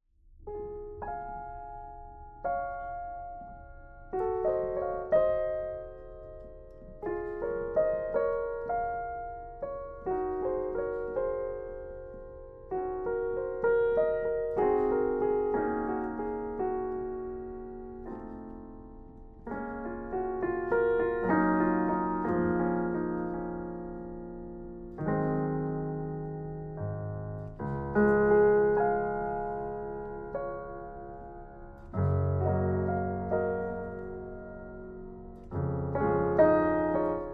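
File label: PIANO CLAIR DE LUNE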